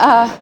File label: ah, Voice ooh ahh